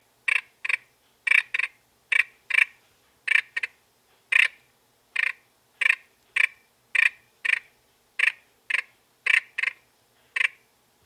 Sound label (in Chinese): 梭得氏赤蛙